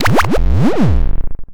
you lose; crazyeights

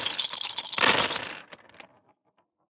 dice; game chaosbear

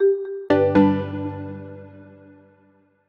account approve

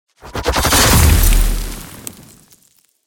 avalanche; battle

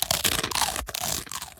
snapping jaw; battle

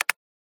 typing

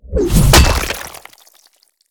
ancient warhammer; battle

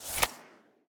play card; squares

